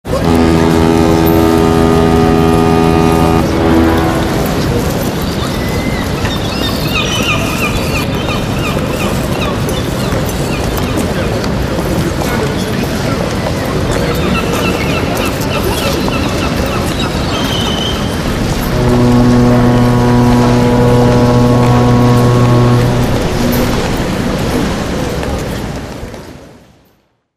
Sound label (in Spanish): Muelle Claxon de barco desde el muelle Paisaje sonoro CIUDAD